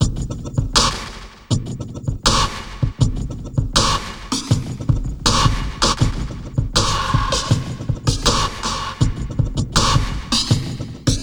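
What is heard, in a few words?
Loop, beats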